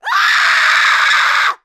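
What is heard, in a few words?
woman's fright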